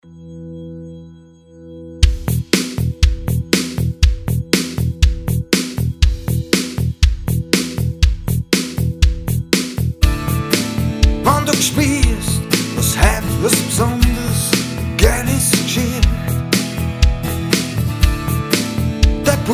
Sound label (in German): hackbrett jingle live; Hackbrett Intro Jingle